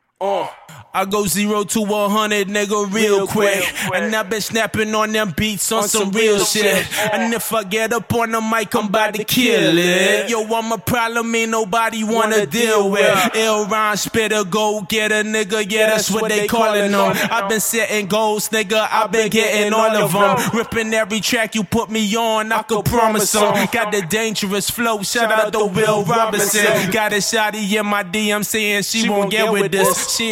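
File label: hip hop 150 A minor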